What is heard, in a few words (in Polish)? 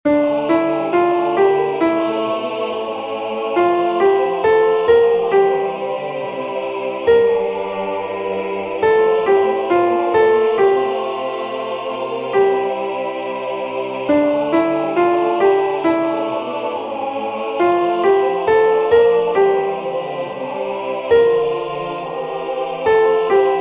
sopran; Opieknosci